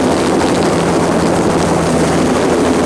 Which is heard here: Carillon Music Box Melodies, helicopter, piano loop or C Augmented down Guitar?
helicopter